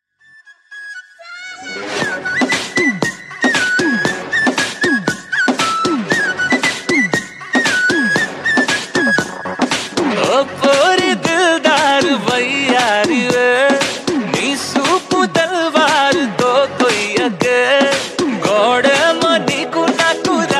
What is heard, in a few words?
Bgm